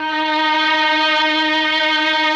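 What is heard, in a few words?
MELLOSTR, Mellotron Violins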